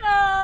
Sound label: whine